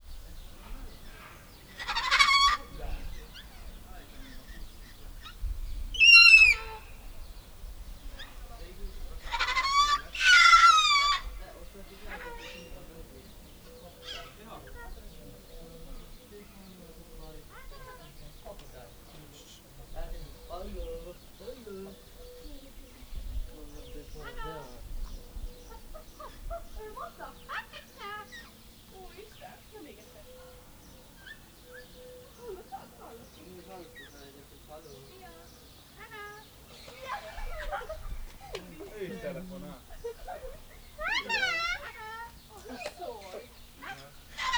látogatók beszéde, vari kacsarkodása, sárgabóbitás kakadu; állathangok; nyíregyházi zoo 2010

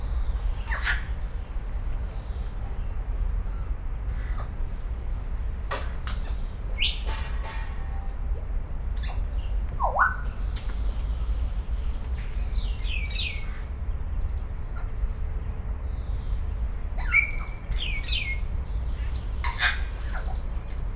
halkan, jákópapagáj; szegedi zoo 2011

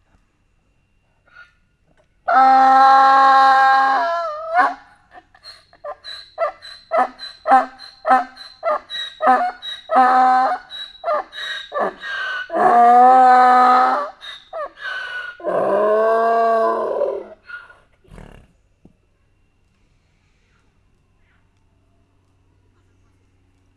kürtőskalács nassolás után, magyar parlagi szamár; szegedi zoo 2011

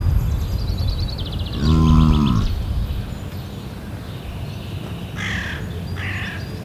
széles avégen szürkevarjú, kardszarvú antilop; debreceni zoo 2012; marantz puska

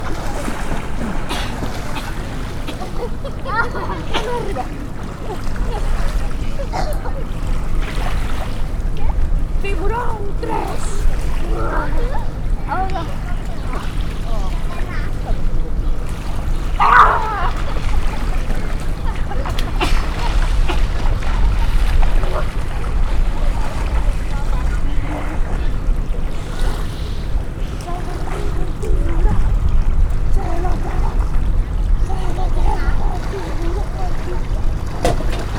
strandolok egykori bortonsziget

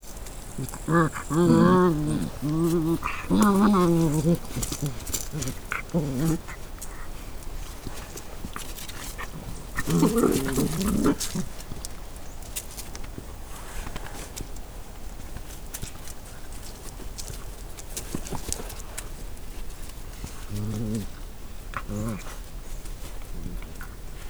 kölykök morgása, kutya; állathangok; paraszt udvar

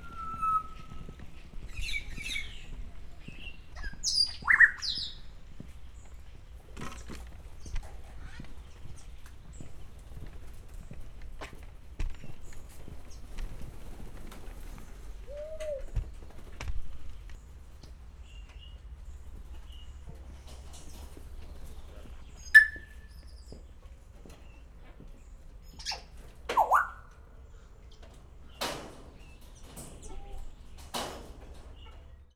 jákó papagáj kifutóhoz megérkezve; szegedi zoo 2011

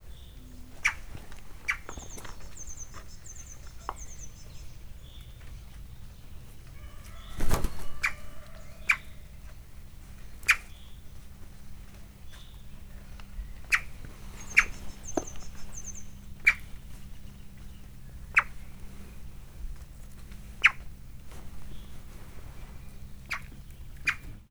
ide-oda röpdös, szarka; állathangok; miskolci zoo 2009

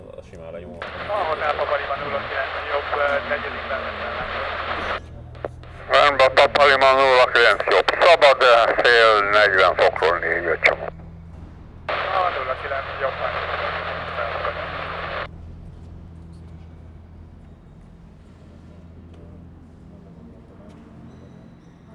róviden, adóvevő, budaörsi reptér

